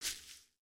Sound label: Foot Grass Walk, footsteps